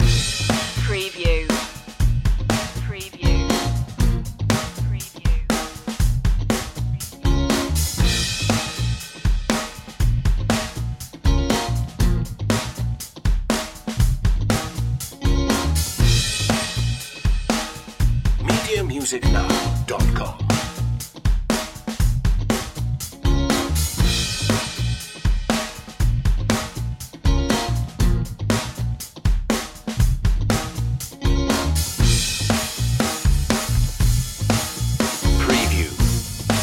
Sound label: funky guitar and tonewheel underscore